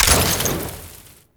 Ammo Pickup Heat; Ammo Ability; Warframe; Warframes; Frumentarius

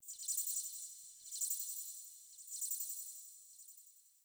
Insect; Warframe; Tau

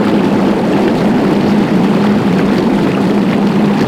Galleon Water Tube; Warframe; Grineer Galleon

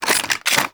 SMG Reload B; Tenno; Protea

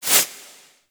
Undead Owl Close Flap; Dante

